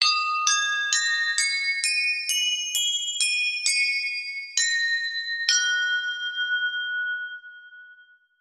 TIB BELL; eShop

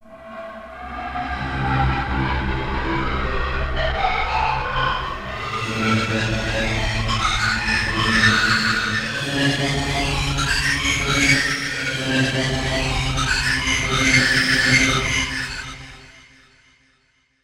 air tube; eShop

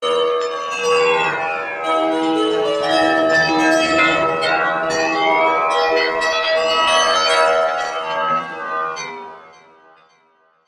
china ball; eShop

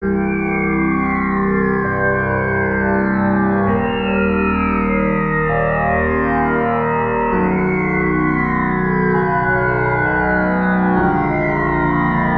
HARMO DRONE; eShop

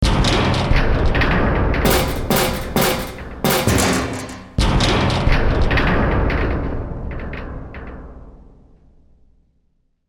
SIGN HIT; eShop

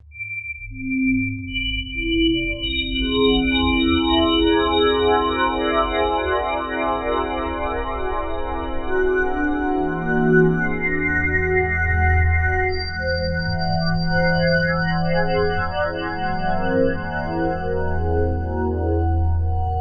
WHOLETONE; eShop

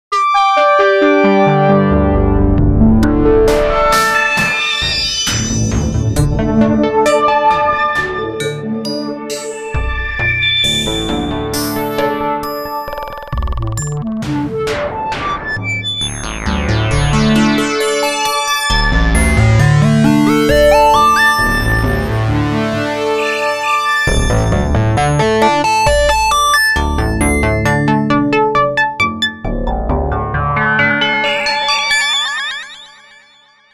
Pads

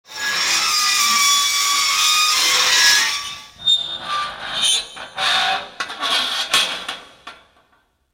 SPRING STL; eShop

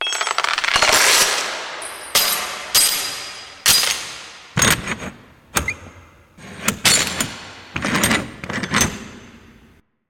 CHAIN

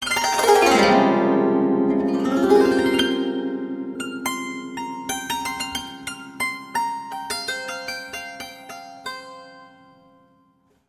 KOTO

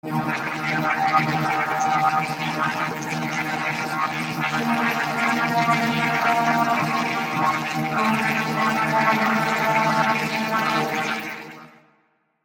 ALIEN DRIP alt; eShop

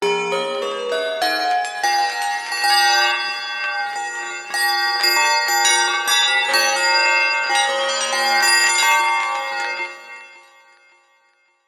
china ball; eShop

